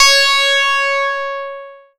C#, Synclav FM PLUCKED; Big Fish Audio

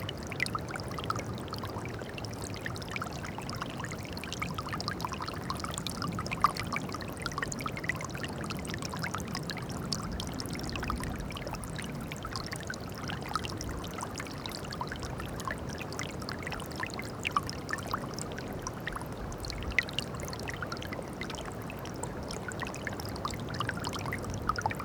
Gentle Water Flow; WATR Flow

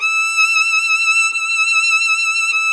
VLN E5; Sonic Reality; American Heartland

